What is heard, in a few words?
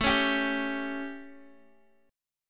A 7th, Guitar DADGAD; chord